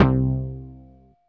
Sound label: synth JX 1, dance synth; Sound & Vision - Gigapack I CD 2 (Roland)